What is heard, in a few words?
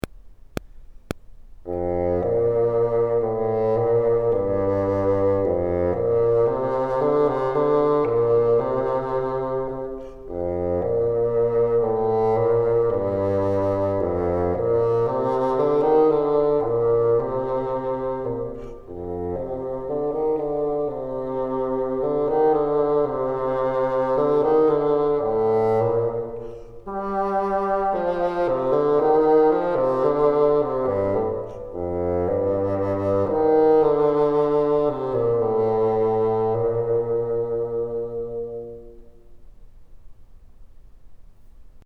top, bassoon